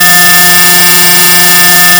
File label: fm voice single; euclidean; FFTimbre